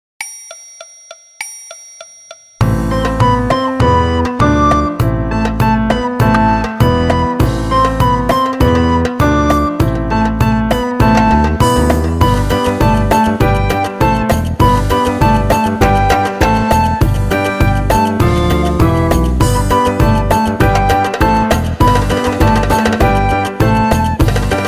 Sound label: Piano Orch